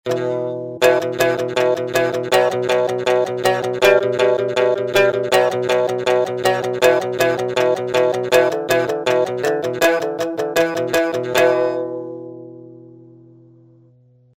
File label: Topshur, Asian Ethnic Sounds